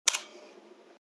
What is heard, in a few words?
keytyping 4; mintty